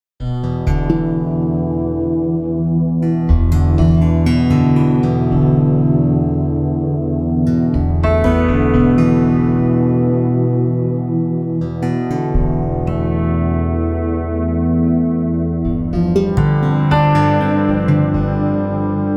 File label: Guitars